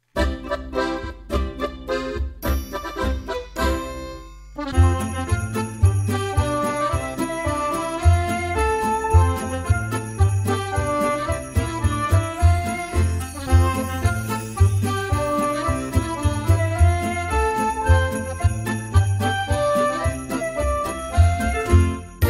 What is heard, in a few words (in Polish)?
SWIATECZNA POLKA